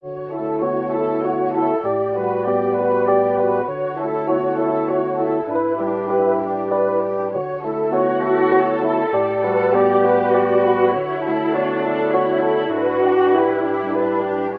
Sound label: strings happy, Phonky loops